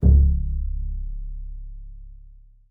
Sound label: C#1, bass pizz; Xsample VOL-5